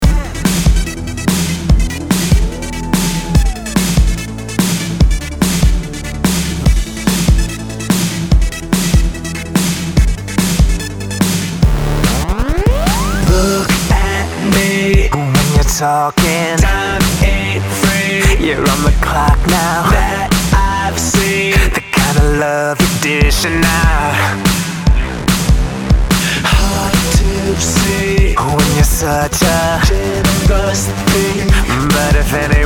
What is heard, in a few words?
Stutter, Guitar Mix